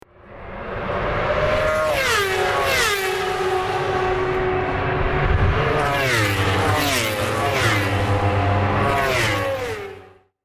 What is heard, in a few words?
Racing SE